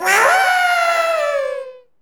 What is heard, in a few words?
SE GAME SLIDE; maimai